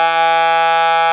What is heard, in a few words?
RAVE BEEP; Best Service Alpha Dance vol.2